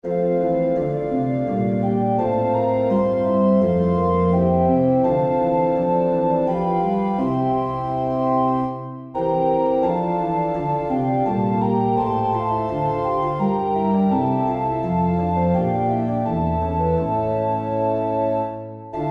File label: organ; Erstanden ist der heilge Christ